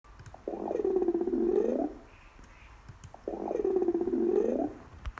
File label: Hungry Belly growling